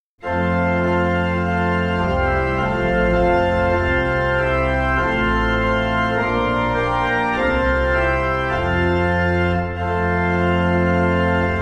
Hark! the Herald Angels Sing, Brass Ensembles, Christmas; Mendelssohn, arr. Cummings